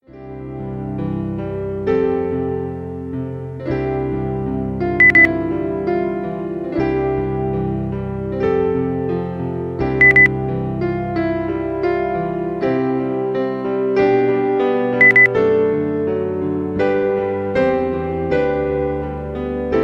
weihnachten oh du froehliche beep

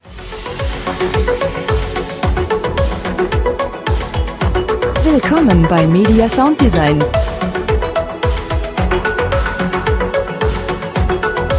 modern roboterfertigung

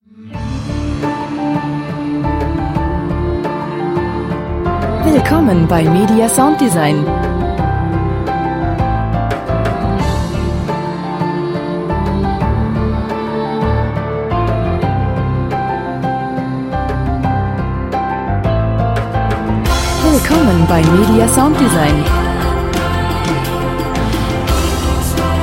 gesang quelle der kraft

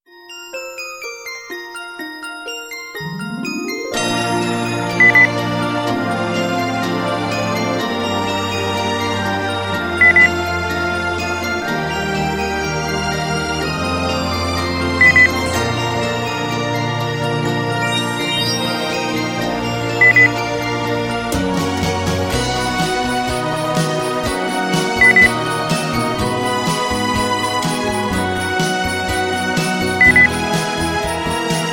weihnachten beep

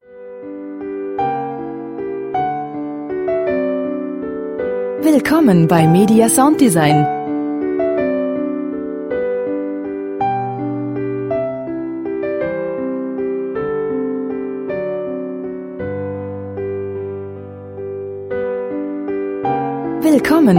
klassik

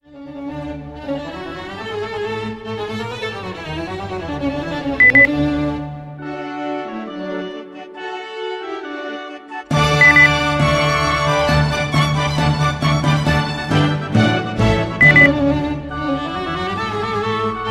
klassik figaros hochzeit ouvertuere beep

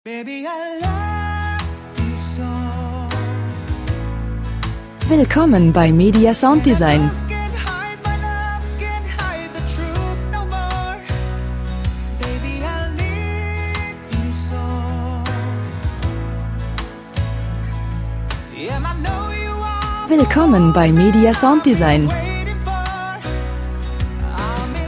gesang true love

